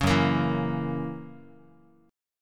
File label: Gb 7th Gb down Guitar, chord; Listen to Gb7 strummed